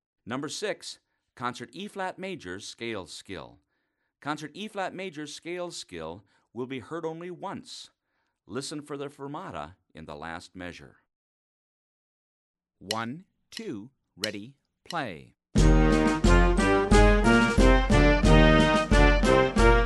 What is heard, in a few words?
scale concert eb